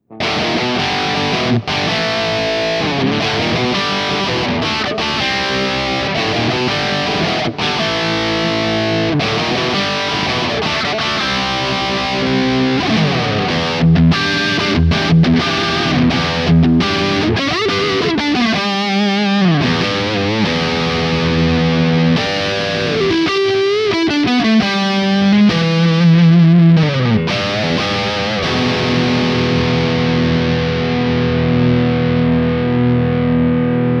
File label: DC DLX Highgain Rhythm Splitted Humbucker; Harley Benton